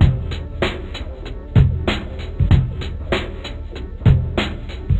Psycho LP, Yamaha DTXpress, Drum Loop

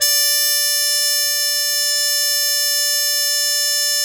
MUTE D2, Mood Trumpet; E-MU Formula 4000 Series Vol. 1; Hip Hop Nation

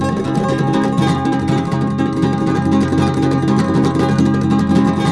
D M, Guitars; Spectrasonics; Hans Zimmer Guitars VOL-2; FLAM TREM CH

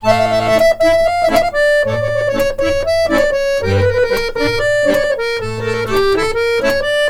ACC, Balkanish Traditions

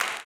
CLAP, Blues Soul; Spectrasonics Vocal Planet

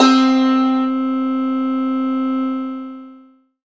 Ethnic Synths, Dance Synths

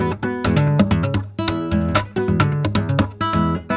Guitar Loop; Ambient Grooves

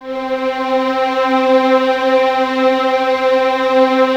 violins C 4, String Ensembles, 23 Violins; Miroslav Vitous

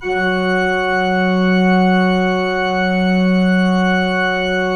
PIPE, 3DOrgans

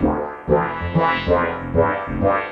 synth arp; Electrocution

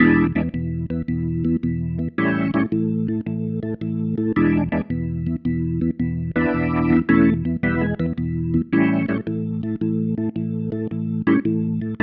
Progression, Organ, Loops

